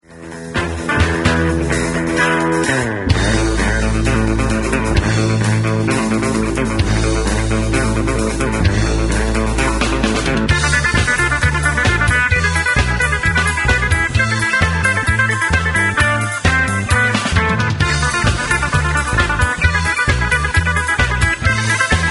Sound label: Guitar